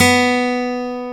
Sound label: B3, Acoustic Guitars; AKAI S6000 CD-ROM - Ultimate